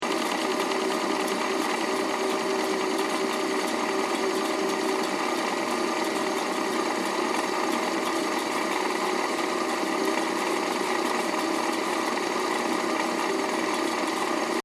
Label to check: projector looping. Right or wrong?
Right